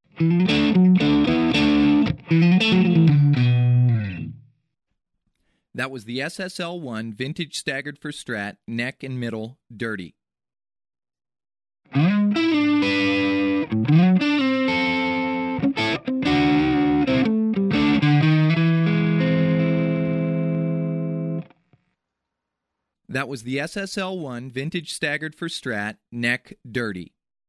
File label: Dirty Neck Mid Neck